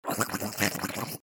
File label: Eat